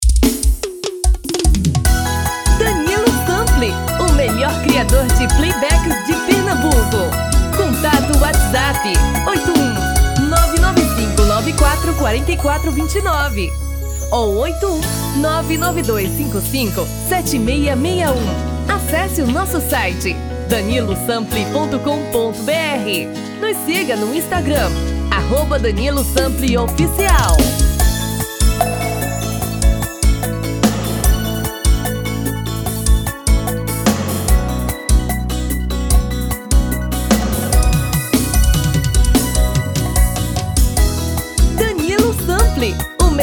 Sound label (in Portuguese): Brega